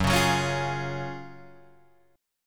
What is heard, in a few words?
Gb Major 7th Suspended 2nd, down, Guitar, Drop D